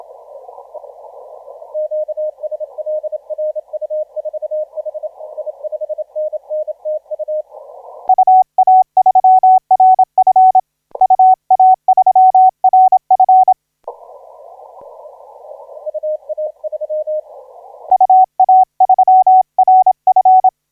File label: 20m